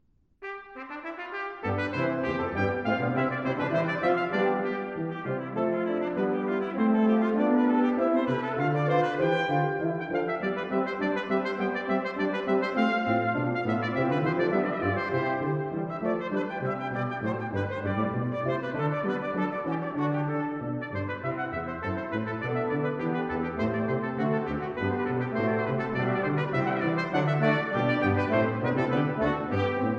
Sonata In C For Trumpet & Strings, Allegro, Brass Quintet; Baroque Brass